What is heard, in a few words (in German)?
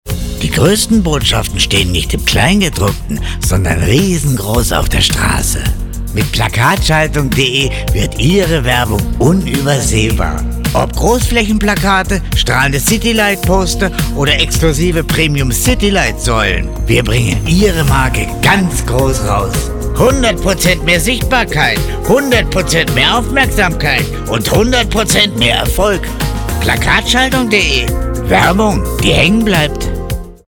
Musik